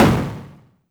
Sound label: Tom High